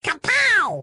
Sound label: kapow